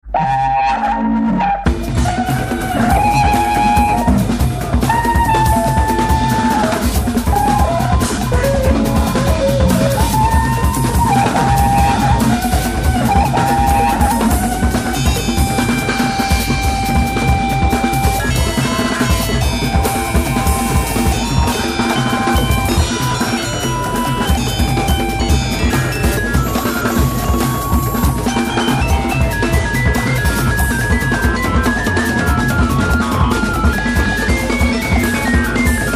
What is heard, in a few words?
noise rok